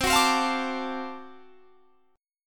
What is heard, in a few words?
D 7th Sharp 9th C, chord